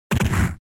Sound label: Fighting Hit